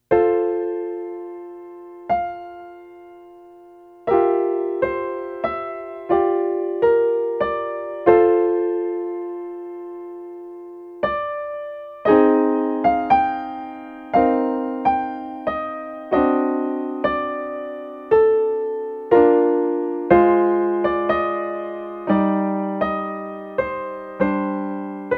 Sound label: Piano Concerto; Grieg